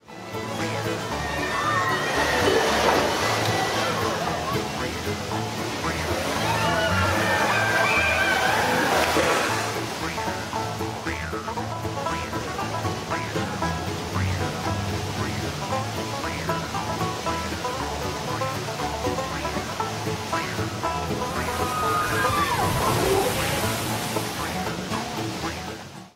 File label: screams; themepark; rides; splash mountain